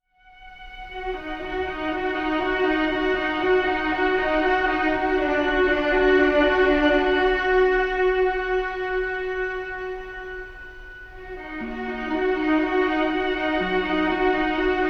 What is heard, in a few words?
strings hustle and bustle, Sad